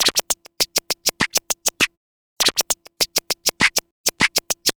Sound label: Vinyl Scratch Clap